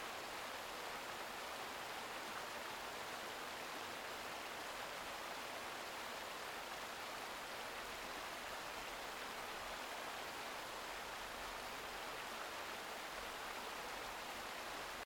Open Ambience With Waterfall Loop; weather fx; Silent Hill Downpour

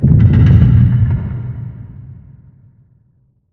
Eerie Hounted House Creak; horror and atmosphere fx; Silent Hill Downpour

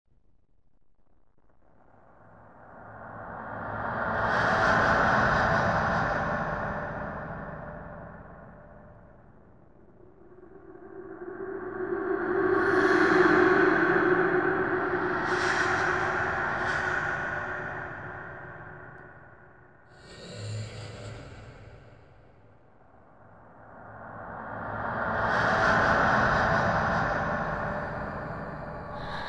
Sewer Sounds

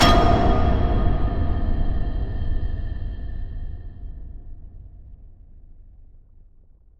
Heavy Stinger; horror and atmosphere fx; Silent Hill Downpour

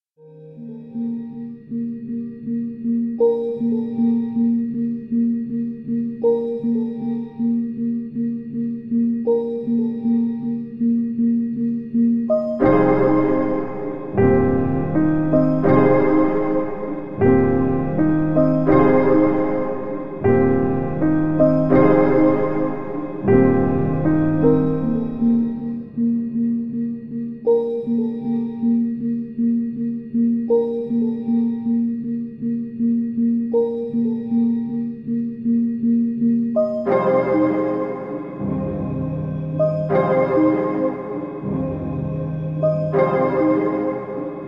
Piano Rehearsal

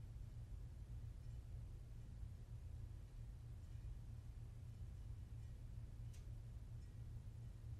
Room Tone Deep Light Rattle Loop; horror and atmosphere fx; Silent Hill Downpour

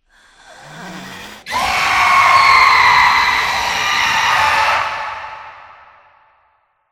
Scream; Screamer; silent hill downpour

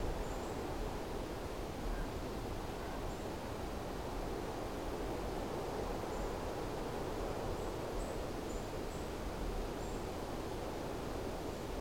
Light Wind Loop; Diner; Silent Hill Downpour

